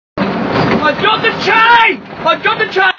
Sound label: Dropped chain